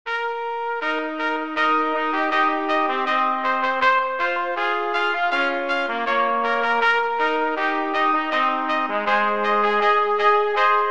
fanfare for trumpet quartet

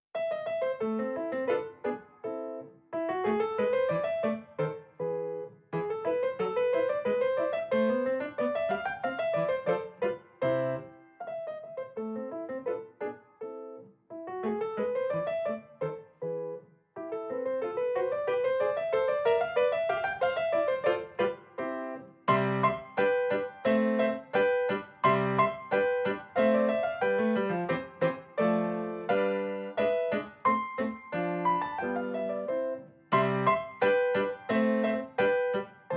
gavotte; Chaminade Cecile

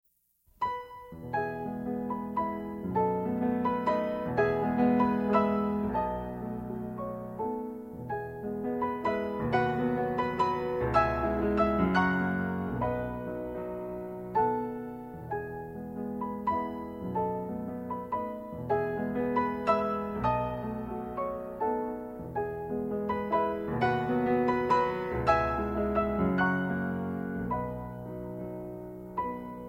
waltz

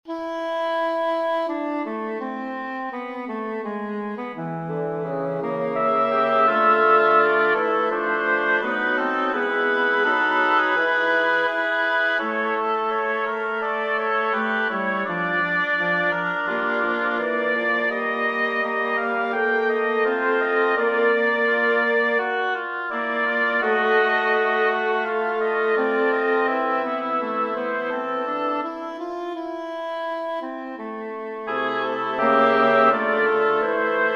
from piano pieces for double reed quartet; raff joachim

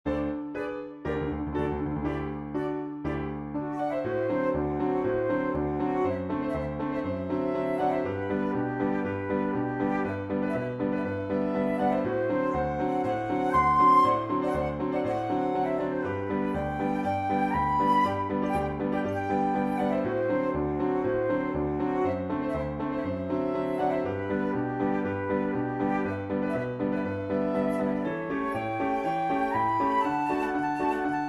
for flute piano; strauss johann; thunder lightning

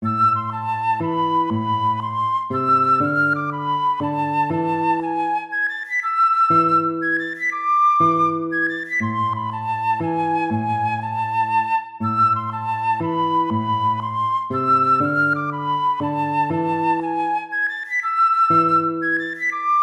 baroque minuet minor

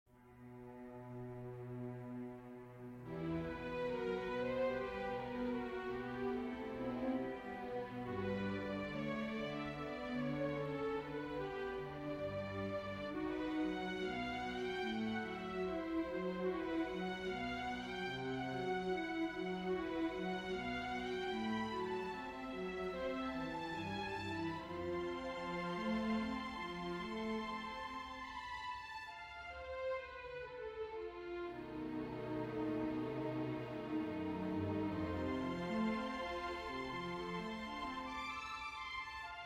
string quartet; Resignasjon

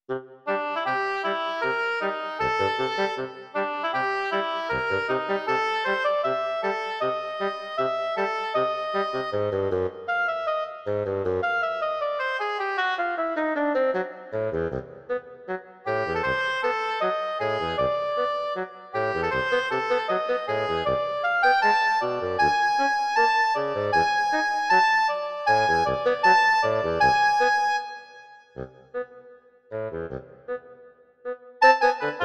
for oboe and bassoon molto vivo

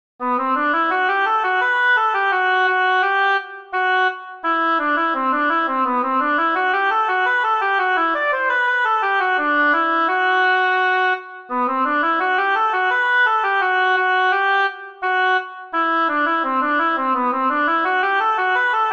draught for flute oboe harp; turlough carolan

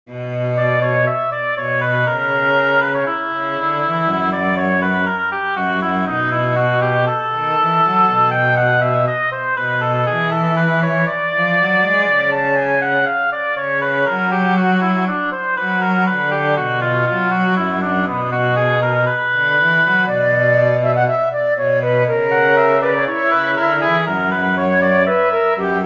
major for flute oboe cello; bach johann sebastian quia respexit from the magnificat

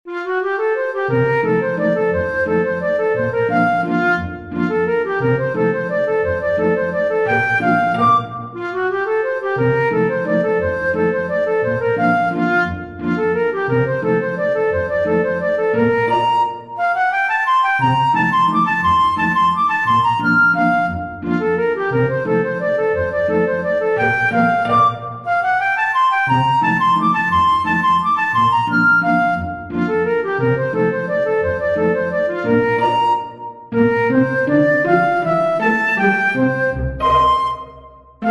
valse ballet for flute strings; satie erik

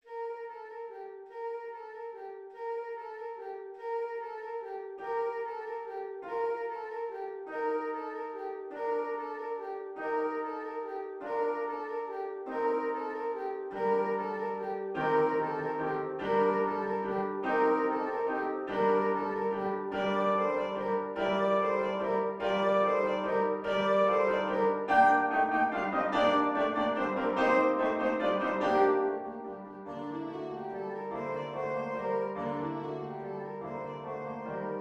carols of the bells; leontovich mykola

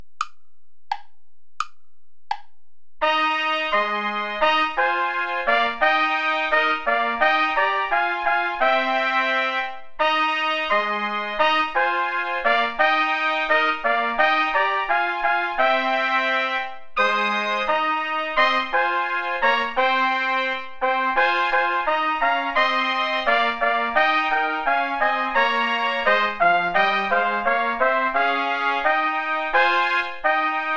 play along without trumpet; schutz heinrich; ich will solang ich lebe

